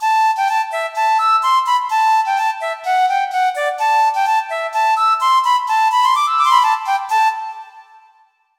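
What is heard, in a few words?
Irish Flute, synth; KORG OASYS; oasys assault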